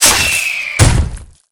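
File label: guardian scorpion spawn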